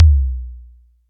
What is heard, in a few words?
mid tom single hit c sharp key